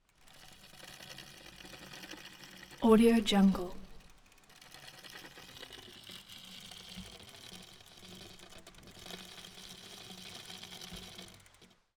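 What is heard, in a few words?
effects exterior bike pedaling grind; collanderson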